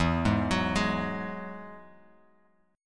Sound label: E Major Add 9th, Guitar Open G